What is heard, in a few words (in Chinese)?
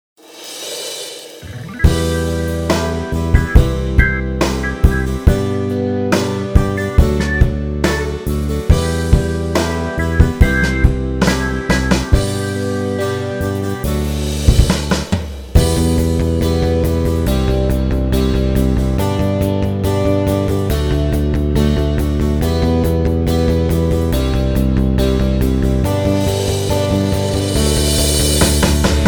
九零摇滚 90s Rock; cubase 编曲助手